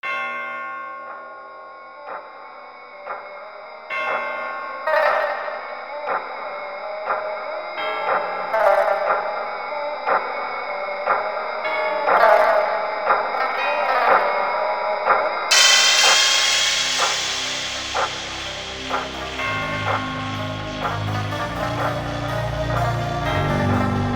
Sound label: Remix Instrumental